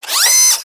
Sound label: BroomBot servo; creatures mechanical